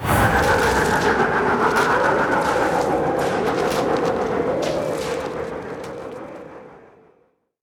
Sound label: leaf whirlwind; heroes nyru